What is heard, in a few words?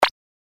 roulette